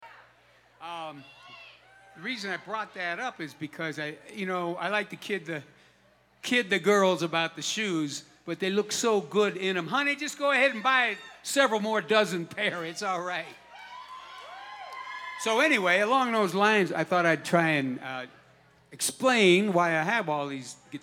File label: Guitar Talk